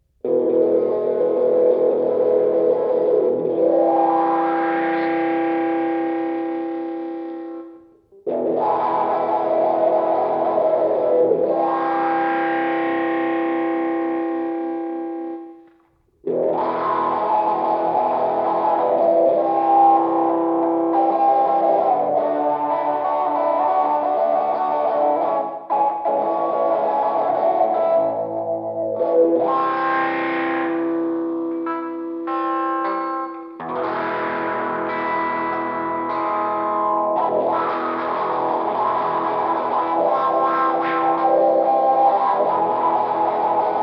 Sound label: electric; MegaEpix